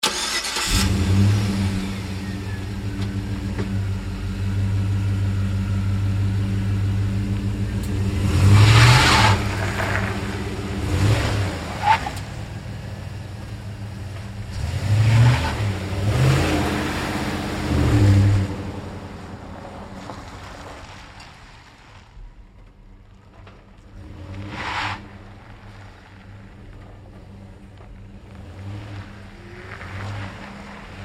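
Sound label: Truck GMC Savana 3 Point Turns Maneuvers Fast; Trucks; Big Room Sound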